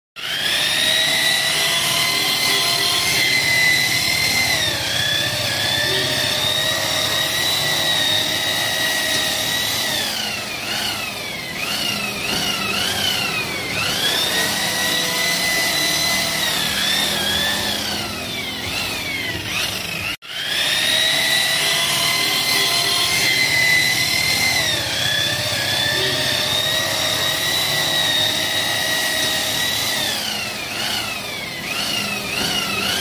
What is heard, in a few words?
Polishing machine